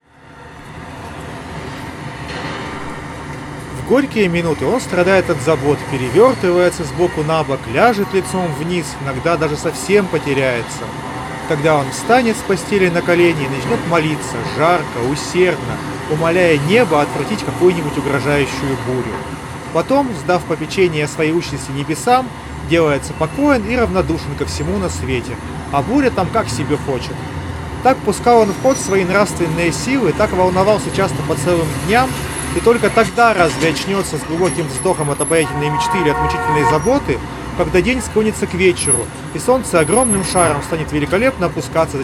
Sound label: Recorder noise; redmi buds 6 pro